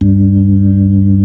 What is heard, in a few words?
B-3